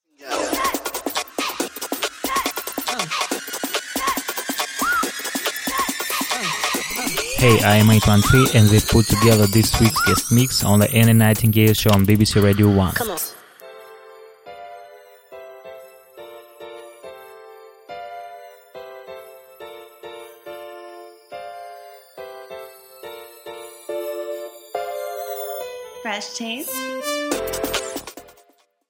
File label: ocarina